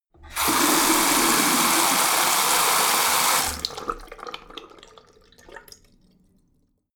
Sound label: tap flow ultra hard short, badezimmer